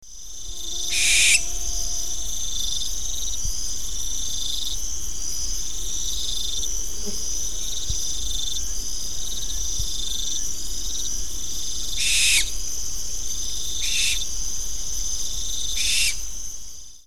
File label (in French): chouette effraie; mana